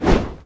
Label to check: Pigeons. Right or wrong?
Right